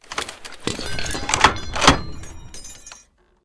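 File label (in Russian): разлетевшееся на части при перезарядке оружие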